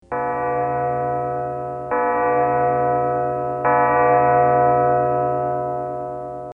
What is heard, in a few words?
defeat